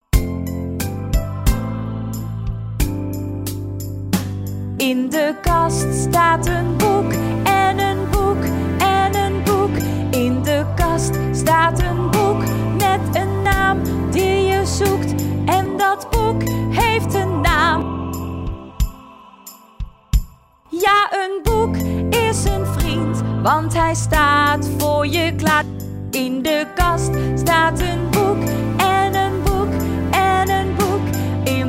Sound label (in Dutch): Zang